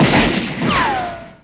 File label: Electro FX; Ueb HE Electro FX